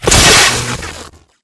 hardpoint electricity; Titanfall 2

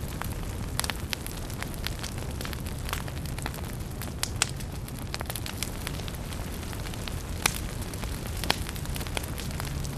fire loop medium crackle; colony; Titanfall 2

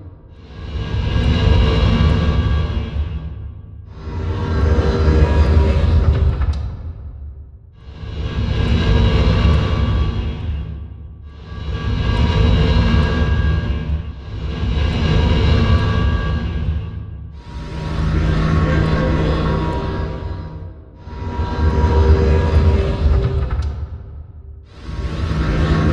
mechanical rotator close; Titanfall 2

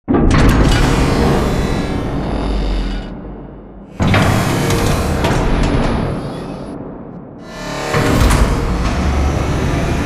Giant Floor Panel Arrive Rotate Lock Mech; Boomtown; Sideways Town; Titanfall 2

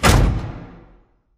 sliding door sm verb close start; beacon; Titanfall 2

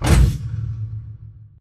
sliding door ext verb close stop; Titanfall 2

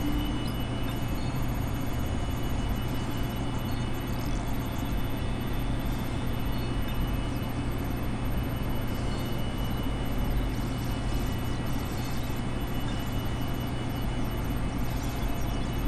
Computer Screen; Beacon; Titanfall 2

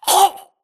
grunt death neck; Titanfall 2

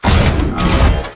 Servo Jog; Titanfall 2

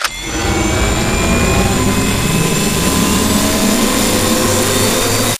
arc cannon chargeup; Titanfall 2

